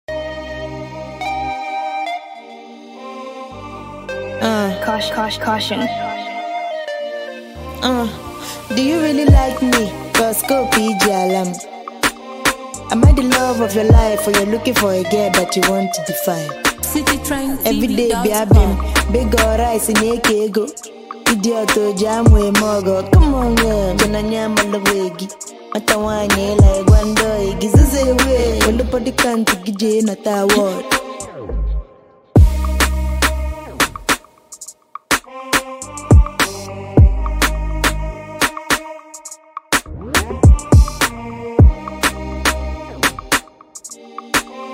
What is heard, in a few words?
Instrumental